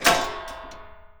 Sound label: METAL, HOUSEHOLD; AKAI Anime Sound FX